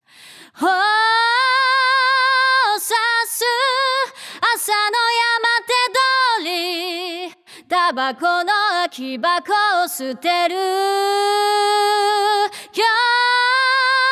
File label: Power